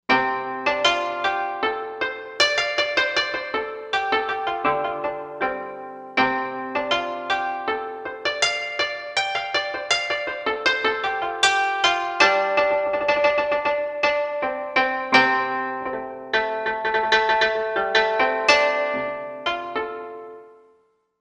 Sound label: Koto, Korg M1Rex; Factory Patches